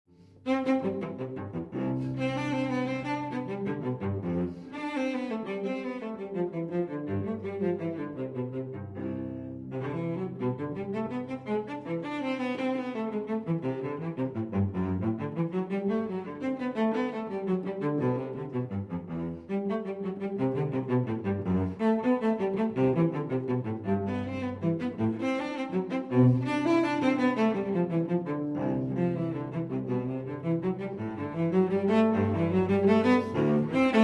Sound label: Cello Suite in C Minor Courante; Cello Suite No. 5 in C Minor, BWV 1011; III. Courante